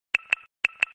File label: Tic